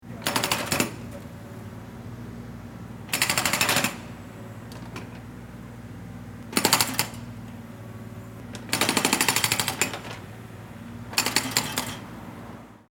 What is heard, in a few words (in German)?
Presslufthammer